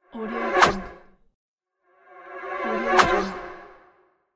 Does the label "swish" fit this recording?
Yes